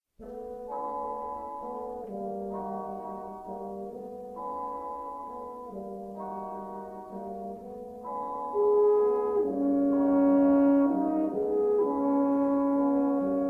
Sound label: Horn Elegy, Brass Quintet